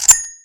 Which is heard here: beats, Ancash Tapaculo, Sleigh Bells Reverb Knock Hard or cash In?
cash In